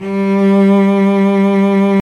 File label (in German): 800Hz Cello